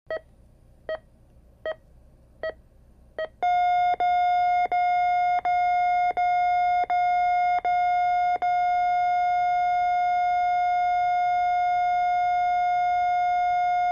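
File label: Heart Monitor Sequence, heartbeats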